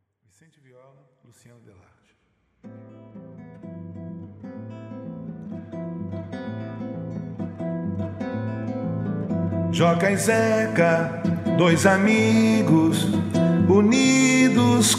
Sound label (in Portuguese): viola